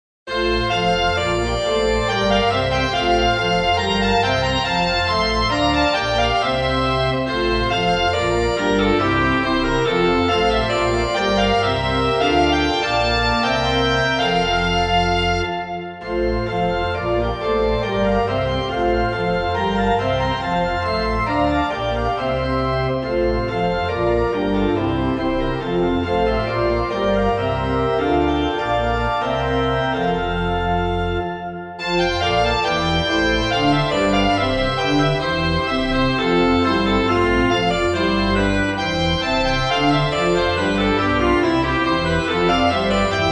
Gavotte; Boyce Wi

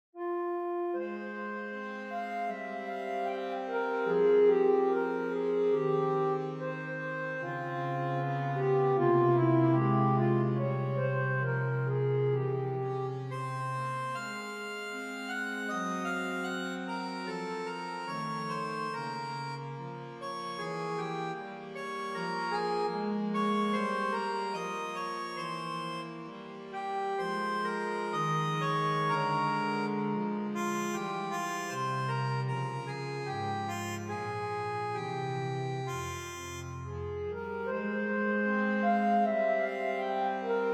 harmonium, verset Fm; Franck

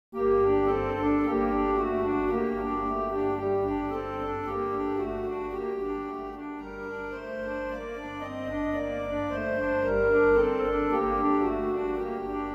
Noël angevin Gm, harmonium; L Organiste; Franck

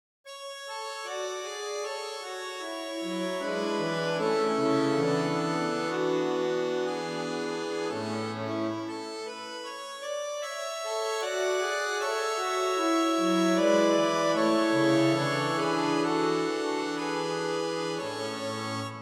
Allegretto moderato, harmonium; P Posthumes; Franck

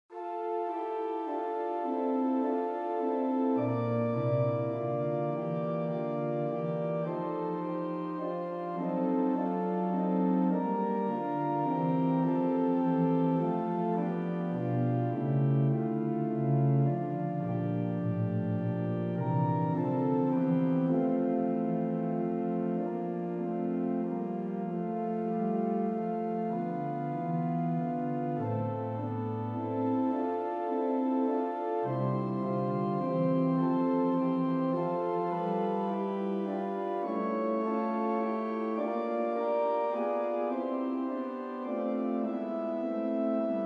Choral; Brahms Jo

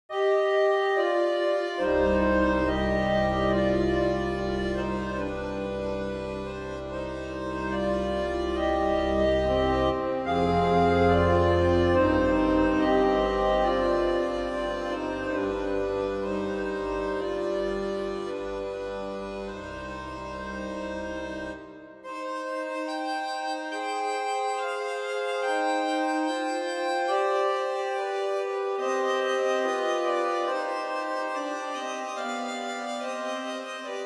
Kyrie Moderato Noel, harmonium; P Posthumes; Franck

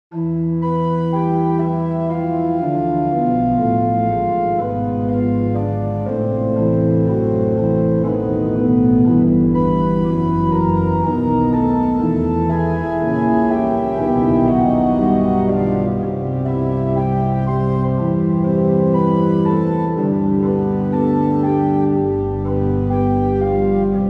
Kyrie Prelude; Beauvarlet Charpentier JJ Journal

